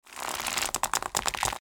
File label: Rope tighten and stretch short